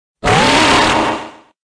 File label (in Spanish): Grito de Wyrdeer; Gritos de Pokémon de la octava generación